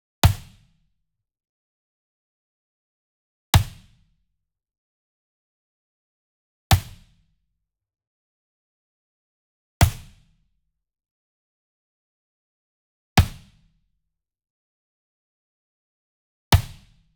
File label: SWEETENER Whip Crack Resonant Hit Near; boom library; Cinematic Strikes Construction Kit